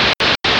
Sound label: walkie noise; DeadFright